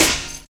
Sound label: snare, metallic; Drum Hits; Drum Loop Hits; Metallic Rolla Hits